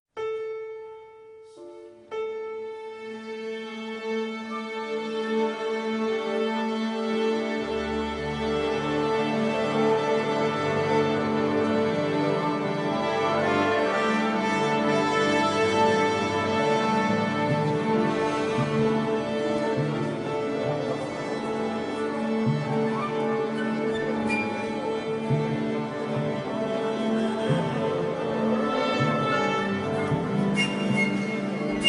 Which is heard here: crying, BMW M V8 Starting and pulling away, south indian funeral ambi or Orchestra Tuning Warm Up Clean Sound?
Orchestra Tuning Warm Up Clean Sound